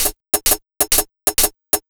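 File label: 130 jam hi hat, Ragga & Dub Reggae; USB Soundscan vol.27; AKAI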